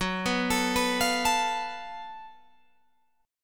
F sharp Minor Major 7th Double Flat 5th, Guitar, Open G